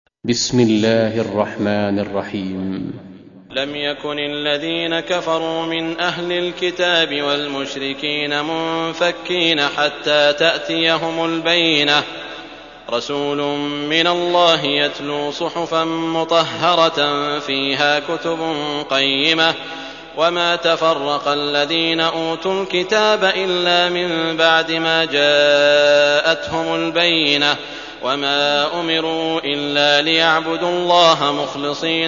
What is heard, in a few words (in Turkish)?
Beyyine, kuran; sudeysi sureler